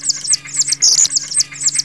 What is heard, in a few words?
chicks, hawks eagles